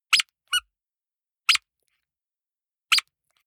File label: squeaker squeeze hard